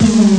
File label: car; ST-XX Amiga Soundtracker Sample Packs